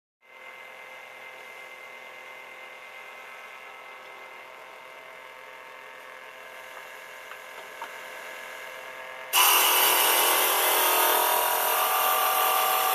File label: Construction